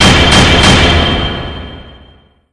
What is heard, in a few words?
triple gavel; vortexjud